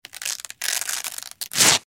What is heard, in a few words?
Velcro Or Cloth Rips C